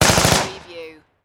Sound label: Machine Gun M4A2